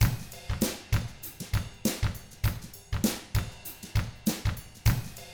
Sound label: FUNKY, Drums